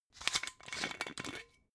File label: glass bottle massive open scratch